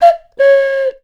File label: bird calls, percussion